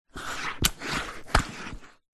foley footstep boot movement shuffle wet ground puddles